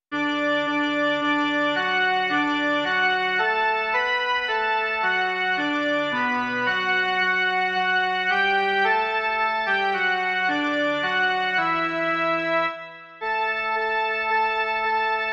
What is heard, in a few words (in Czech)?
jen melodie; Každý den začínám